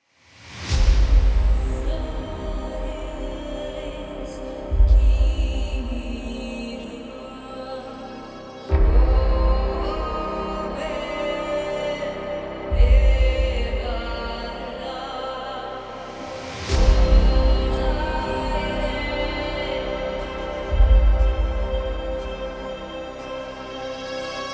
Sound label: Darkness Horror Tense Trailer No Percs; Luke PN